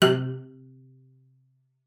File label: CELLO FINGER C3; 90 s Sample CDs; Xsample VOL-5